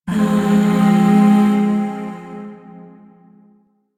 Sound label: Zariman Void Shawzin Phrygian Chord